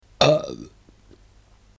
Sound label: burp